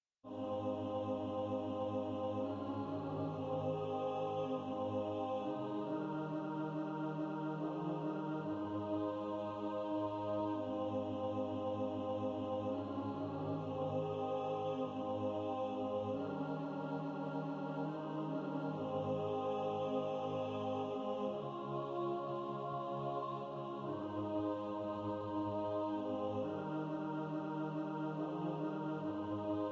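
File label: alto, practice music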